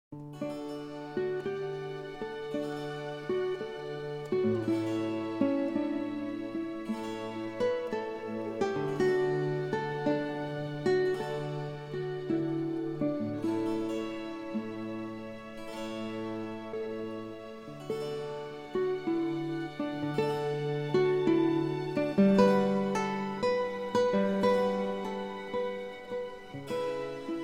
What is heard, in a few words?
Chamber Concerto in D Major, Largo